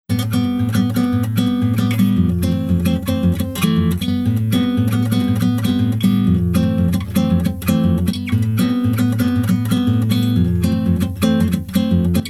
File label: Bossa; Driving